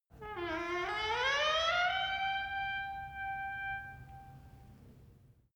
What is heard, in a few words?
wood door old creak high long